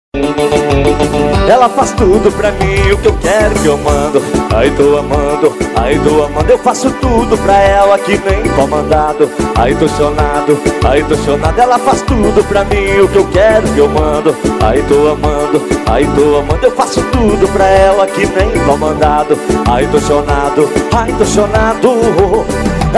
forró

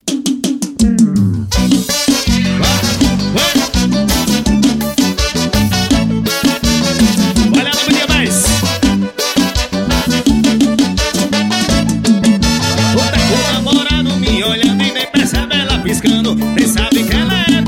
forro promocional

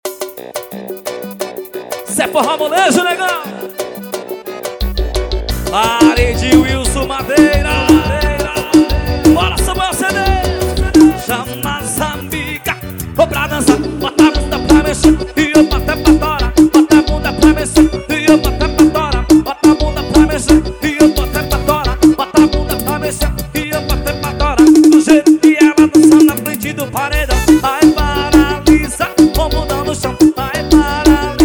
forro trilha

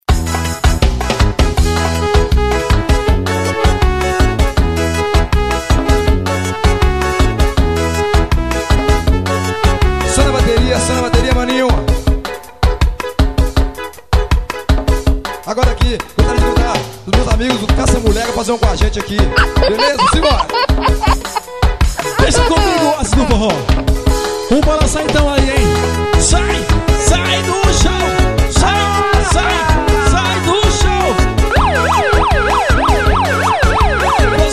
forró

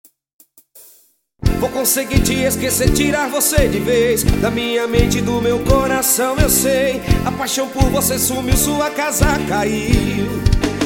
com voz

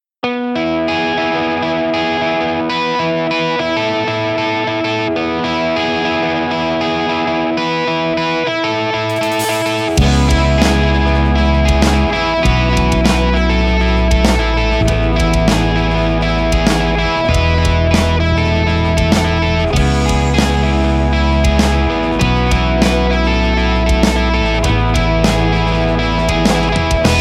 lembranças instrumental

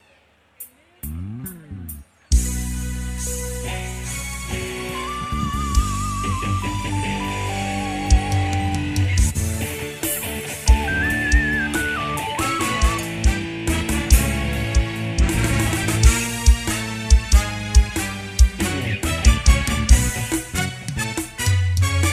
abertura

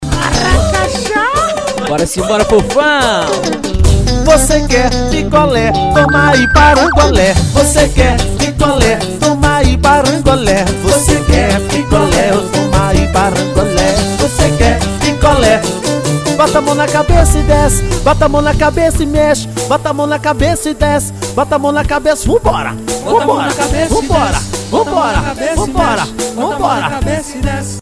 pancadão elétrico swing

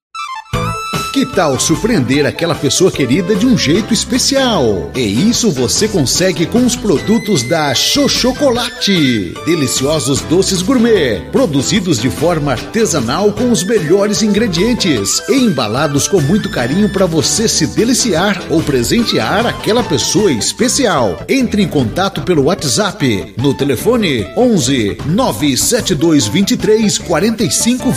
vinheta

